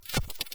short clicking rattle glitch

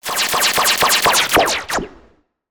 Sci-fi Malfunction Rumble

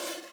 Soft Slide Short

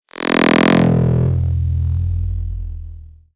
sci-fi buzz

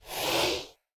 Swoosh Transition

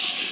Hard Slide Short

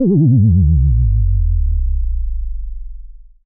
Oscillating Bass Drop

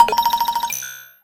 Multiple UI Beeps

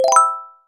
bells upward, rewards

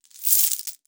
Coins Shake Clinking

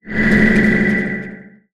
Sci-fi Transition

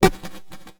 Beepy Glitch

